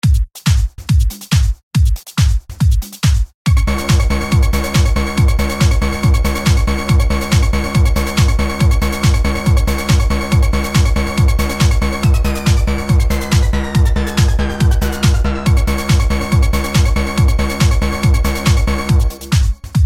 Hardstyle Trance

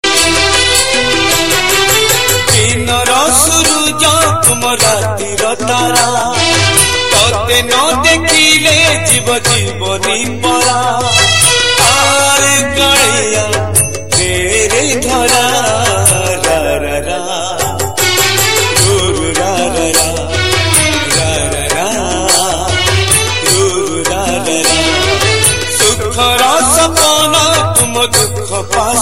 Oriya Bhajan

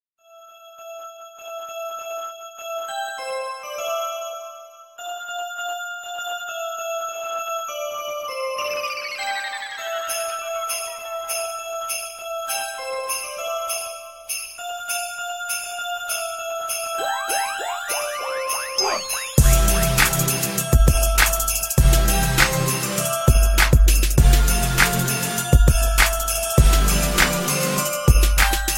anime jingle mix

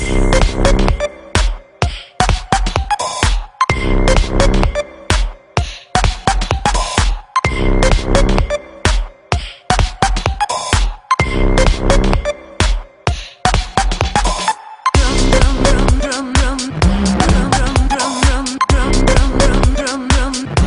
The Drummer

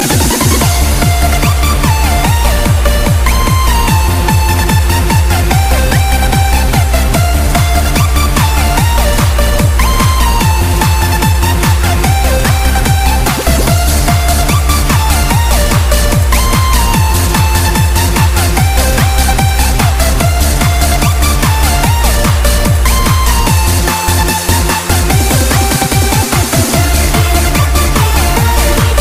Bass

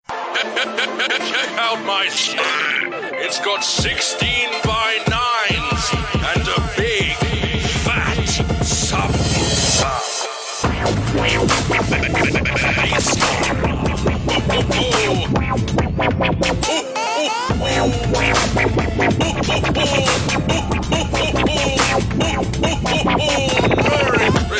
Dupstep; Santa Claus

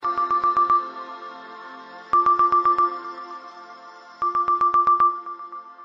Pretty Message Tone

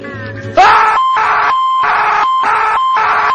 Screaming

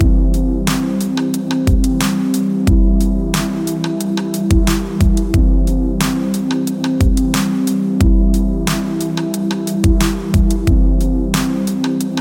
chillout

